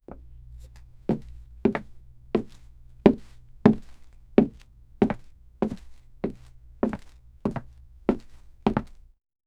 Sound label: footsteps on wood